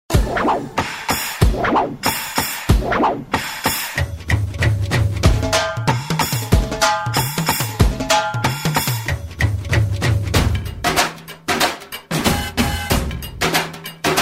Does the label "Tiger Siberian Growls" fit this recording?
No